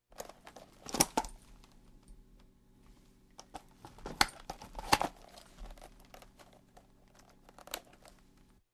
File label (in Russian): Kobura berut oruzhie kladut